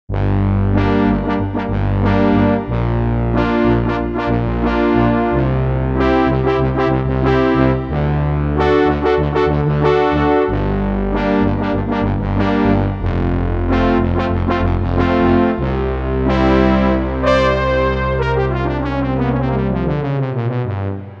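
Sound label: Analog Soft Brass, Korg MSC-1S; Korg MSC-1S Variety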